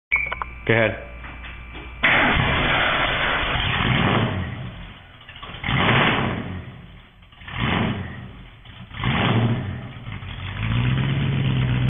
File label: hurst scrambler, exhaust systems